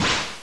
dash

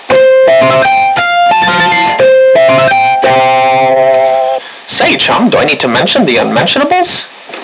vend; ambience

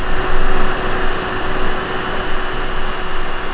computer loop

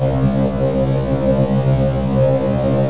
tank noise

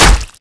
cleaver hit bod, weapons

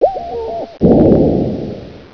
disaster; ambience

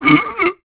zombie pain, male

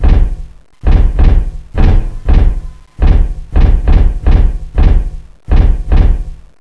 bang door

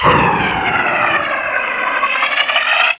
gunner rocket

